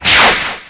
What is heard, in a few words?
COUNTER; ATTACKER